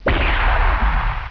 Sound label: laser